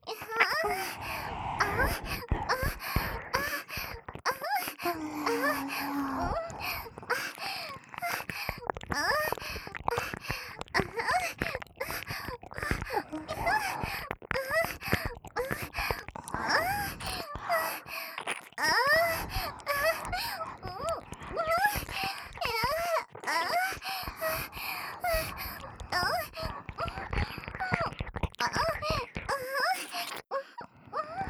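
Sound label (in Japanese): 演技喘ぎ＋片耳舐め; 美冬; 小春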